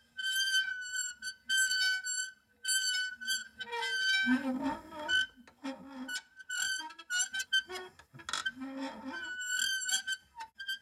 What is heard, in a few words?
metal squeak loop; teardown; ps5 windows xbox series xs